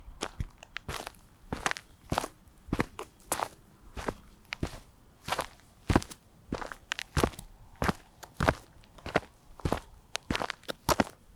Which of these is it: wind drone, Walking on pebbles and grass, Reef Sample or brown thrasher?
Walking on pebbles and grass